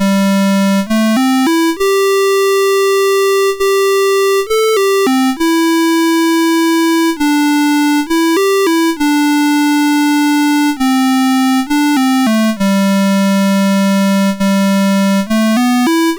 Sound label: Hymn, MOS6581R3; Philmont Hymn